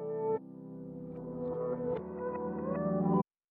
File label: 150 BPM G Maj Glitch Chop; Reverse; Stems; Cymatics; 2022 Melody Collection; Wishing Well